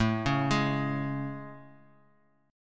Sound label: A Major 7th A; AM7; arpeggiated; JGuitar